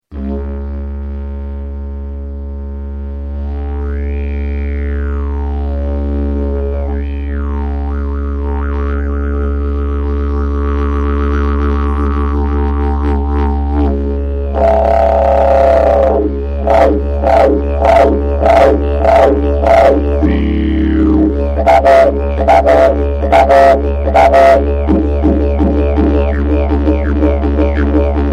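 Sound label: Cm, didgeridoo; trevor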